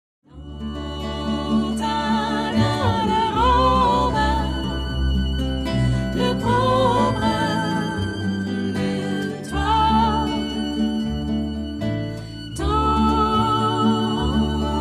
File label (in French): valse